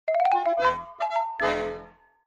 Vivo X100 Pro SMS Ringtone

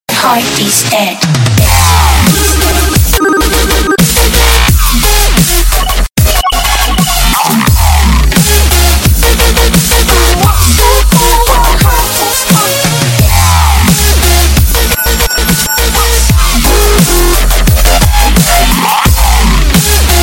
Samsung Galaxy Note 3 Remix

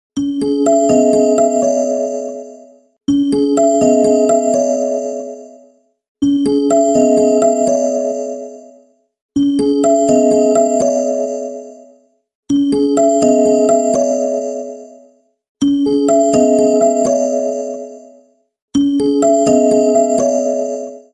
Best Ringtone For Vivo Phone

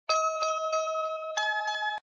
Vivo iQOO 12 Pro SMS Ringtone

